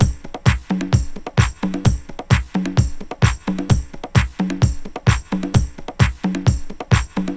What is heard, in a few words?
130 Pop 70S Beat, Drums, Very Fast Tempo; Pro Tools Essential Loops; Digidesign